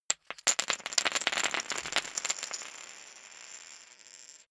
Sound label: poker chips drop